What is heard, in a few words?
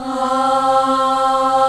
MIXED 4, ORCHESTRA; Roland - LA Composer vol. 1